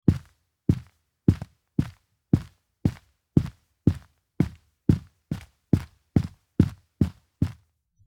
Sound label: Carpet Footstep; horror; horror sfx vol 1